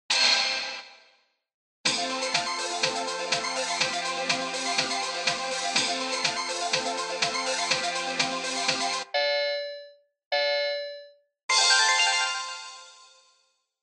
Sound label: Bingo Machine Digital, Speaker